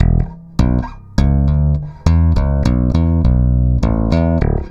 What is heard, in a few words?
Stick Loop, Stick Bass